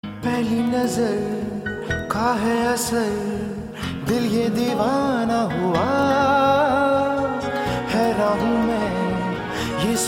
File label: Pop Song